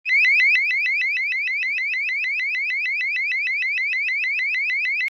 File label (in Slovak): tón č. 28, opticko-akustická signalizácia; LED opticko-akustická signalizácia A-MLOAS 24V AC/DC